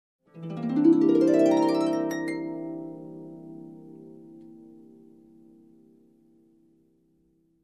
Slow dreamy harp chord

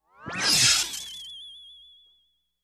Magic Spell

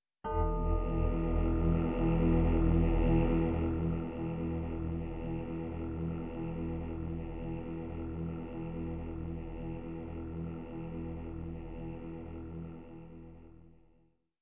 Dark spooky cemetery drone layer

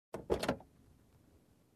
Compartment rattle

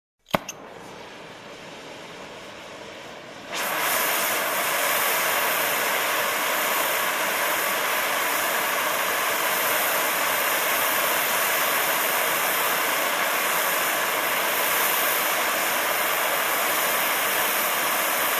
Air Compressor

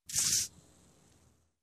Pepper Shaker

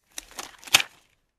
Sewing Machine click

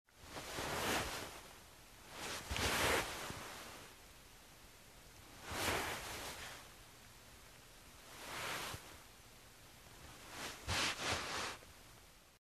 Rubbing on soft cloth

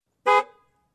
Car honking once

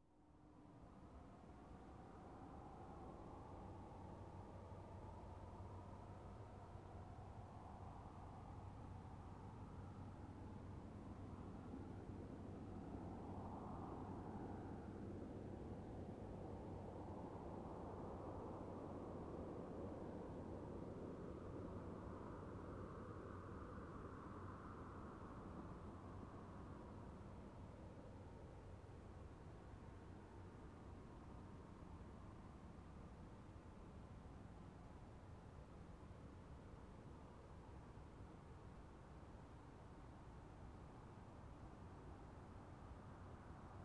Car parking and engine shutoff

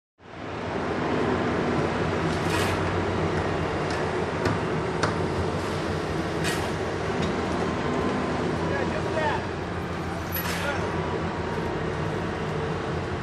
Men at construction site working

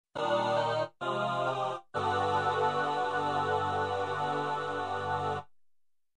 Synthetic choir chords

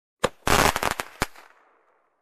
Firecrackers

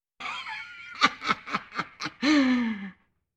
Unhealthy woman laugh

